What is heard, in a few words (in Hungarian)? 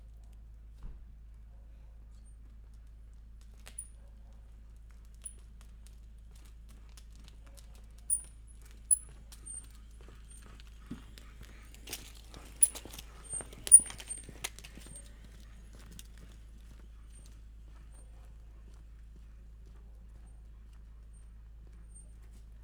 balrol, talicska; varboc